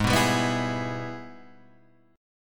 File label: G sharp Minor Major 7th Sharp 5th G sharp down